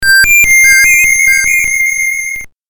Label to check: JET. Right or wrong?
Wrong